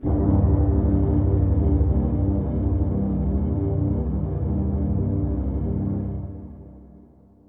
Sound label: SYNTH PAD AMBNT 160 C, Ambient; eJay; Sphere